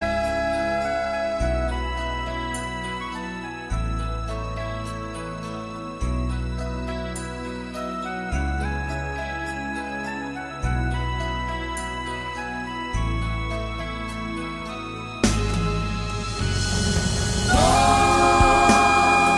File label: Recorder